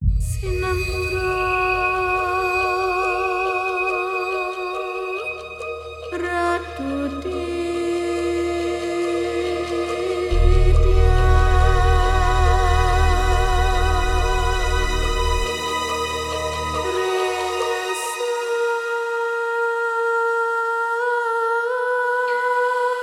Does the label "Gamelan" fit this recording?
Yes